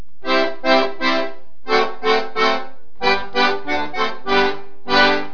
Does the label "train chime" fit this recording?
No